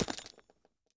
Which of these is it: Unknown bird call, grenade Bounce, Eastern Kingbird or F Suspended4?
grenade Bounce